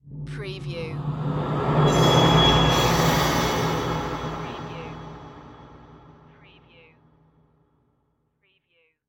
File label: Whoosh Metal